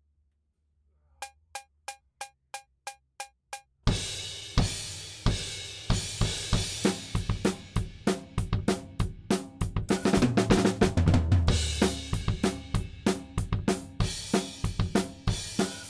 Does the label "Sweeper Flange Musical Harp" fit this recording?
No